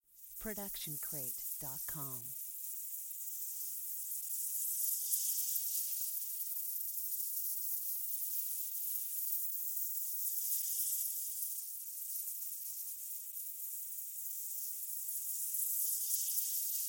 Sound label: Magic Portal; SoundsCrate